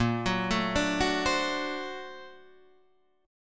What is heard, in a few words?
A sharp Augmented Major 9th, chord; arpeggiated; JGuitar